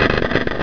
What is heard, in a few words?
drill; zrush